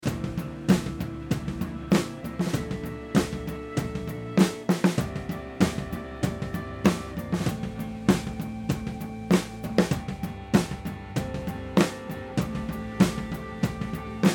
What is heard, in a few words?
Baffles Overheads